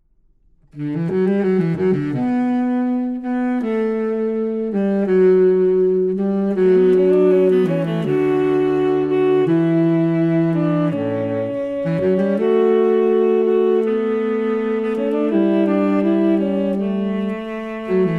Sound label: fugue, classical